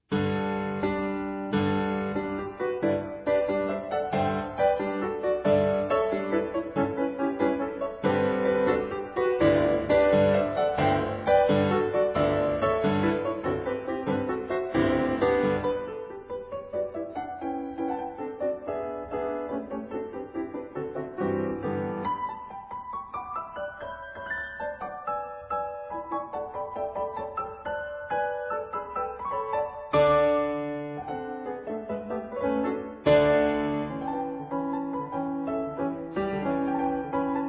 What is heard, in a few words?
Halling Piano; Knut Lurasens Halling